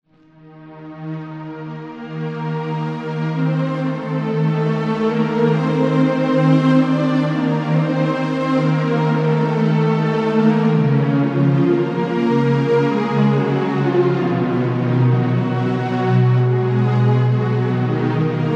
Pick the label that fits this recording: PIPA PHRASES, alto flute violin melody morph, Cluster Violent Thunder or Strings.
Strings